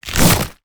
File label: wolf lord claw